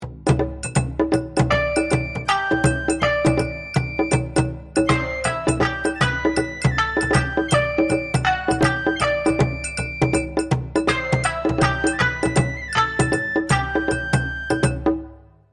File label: rakugo debayashi; granbluefantasy